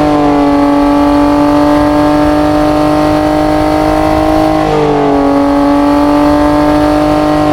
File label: atv full throttle, vehicles; hl2